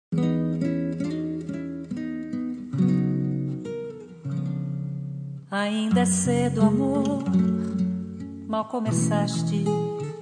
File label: samba cancao